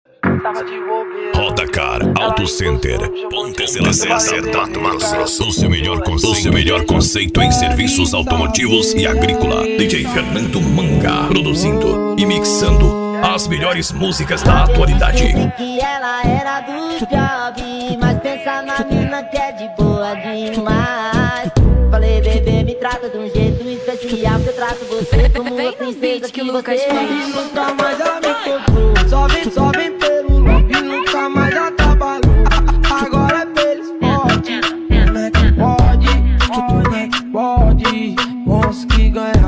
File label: funk